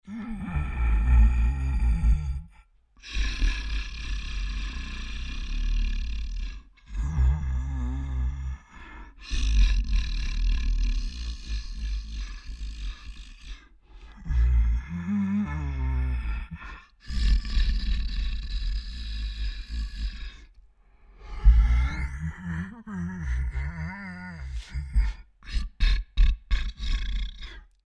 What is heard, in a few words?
Creature Large Breaths Resting Wheezy; Scary Breathing; Big Room Sound